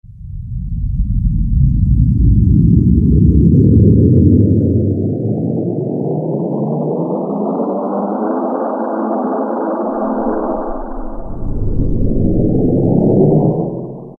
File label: Emergency; Roland U-220; Factory Timbres